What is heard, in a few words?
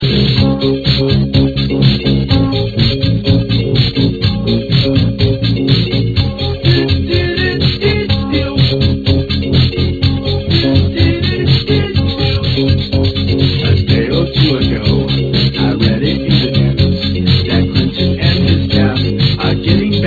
jingle bells